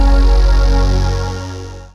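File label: synthesizer Dance; Best Service Gigapack 1; SYN 06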